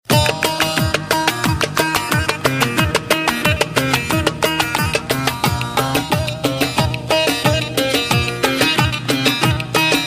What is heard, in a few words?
Turkish Melody